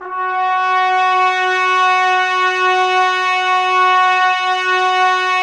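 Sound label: brass trumpets; Miroslav Vitous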